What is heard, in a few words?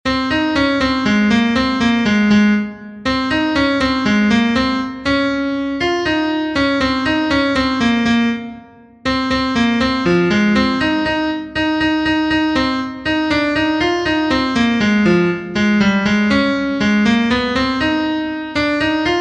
generated melody